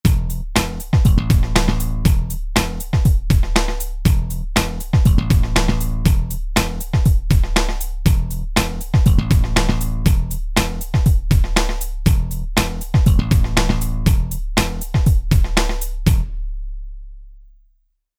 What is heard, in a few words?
Funk